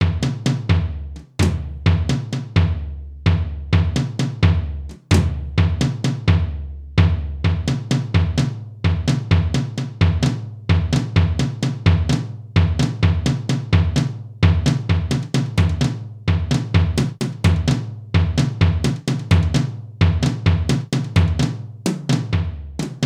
Mountain Beat; Rayman

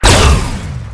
BLASTER CROSSBOW; blockade classic

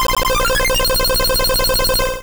Solar Beam

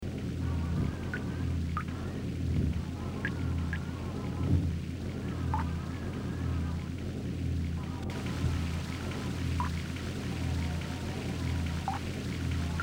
Ambience; Kolibri; 32x

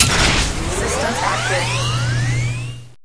mission power On

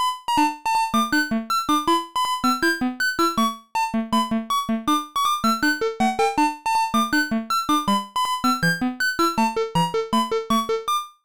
Jingle, CLASSIC FROG DOCK; Frogger Helmet Chaos; nintendo ds

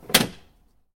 Train Door Open; The 39 Steps

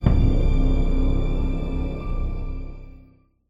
Sting Tense; Game of Thrones: A Telltale Games Series

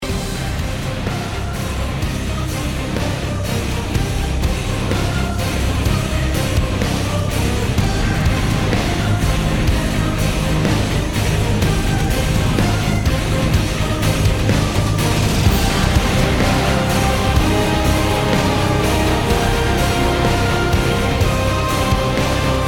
fight run; titans clash; android

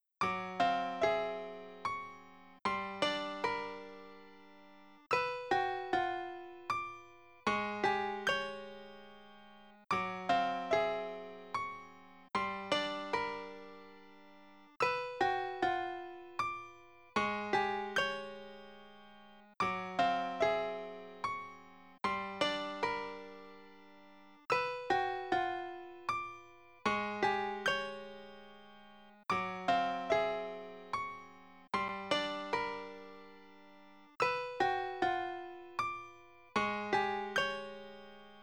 Piano; Piano Fukyouwaon; kuro no juusan gamerip

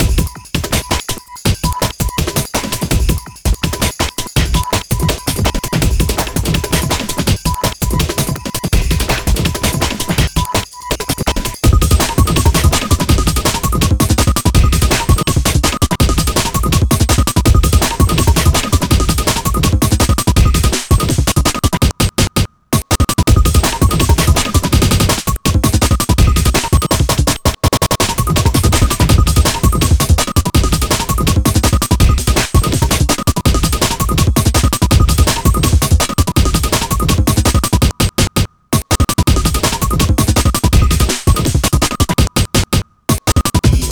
Spin Boss; Alien Hominid HD; Switch Windows Xbox One Xbox Series X|S gamerip 2023

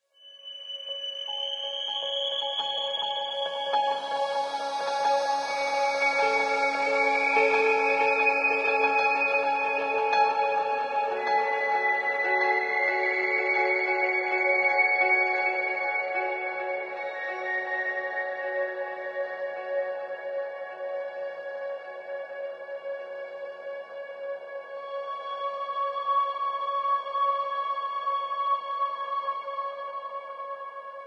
Village Sting; ether one redux gamerip 2015

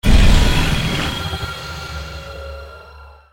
Stinger Action Medium

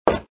wood

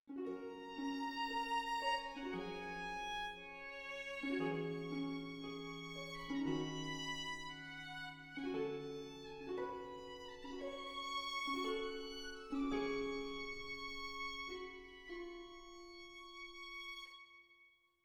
Ending Jingle; Jump King

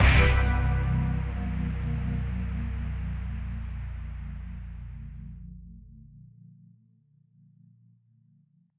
Retry Jingle; Classified; Windows

